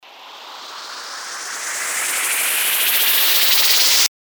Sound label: RISER